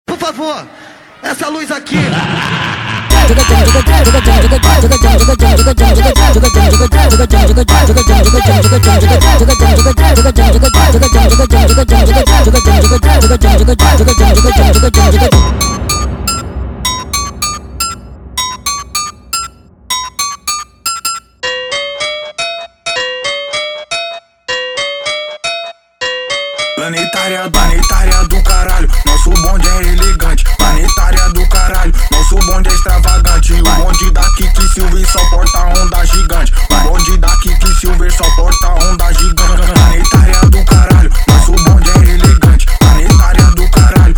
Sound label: sped Up, Brazilian Phonk